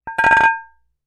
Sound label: Metal; TOOLKIT vol.2